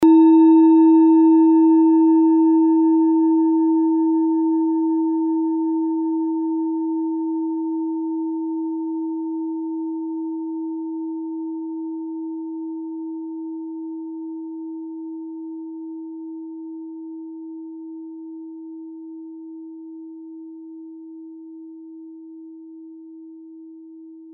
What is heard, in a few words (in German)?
kleine klangschale, Nepal; kleine klangschale nr 34 nepal planetentonschale alpha welle